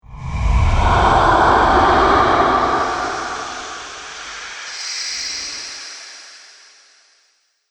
Pyramid Phantom, ghost sound effects; Phantom Sound Effect - Surreal Ghostly Apparitions; Andrew Fleming

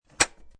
CD Player Close, CD player pushed shut; CD Players (Sound Effects:Electronics)

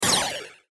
burst warble descend; Buttons (Sound Effects:Multimedia)

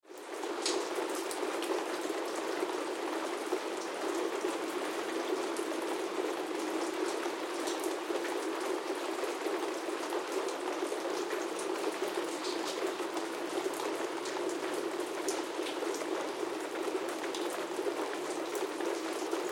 Weather Rain Attic Interior Leaky Roof Ambience

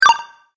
Game Health Points, Video Game Sound Effects

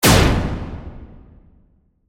Hit Laser Sci Fi, Reverb

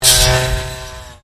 Convolution Pulse

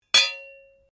Blade Heavy Moving Impact Ring, Sword; Foley sounds for melee weapons; Combat

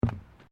Footsteps Boots Bridge Wooden Step; Footstep Foley; Human

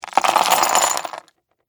Game Pieces, Drop, On, Board; Sports, Board, Game; BlastWave

